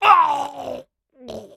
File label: Player Death; AudioWizards